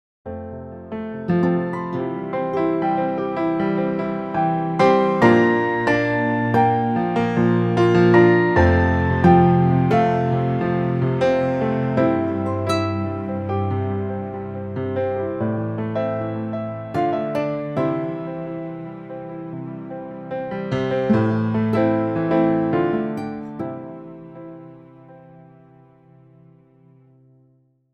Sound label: inst